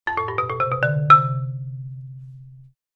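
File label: Marimba Short Finals; mallets marimba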